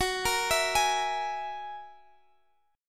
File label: Ab 7th Suspended 2nd Sharp 5th, Gb